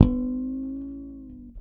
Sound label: Harmonic Bend One Shot C, Bass; Bass X